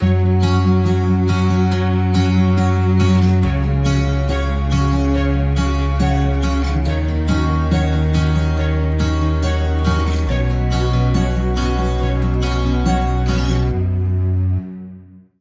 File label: Joyful Acoustic Christmas, No Drums No Melody